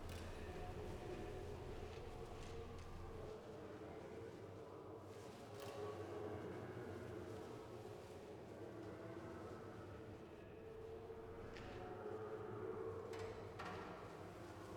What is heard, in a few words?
atmos citadel halls; Hollow Knight: Silksong